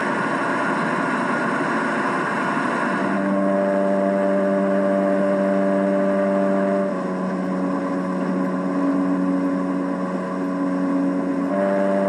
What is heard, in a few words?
commercial truck